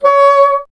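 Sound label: wind bassoon C#; Miroslav Vitous - Solo Instruments VOL-1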